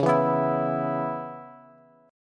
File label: Db Minor 7th, Bass; strummed; 21,19,21,21